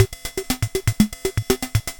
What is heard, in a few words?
Yamaha PSR-22 Salsa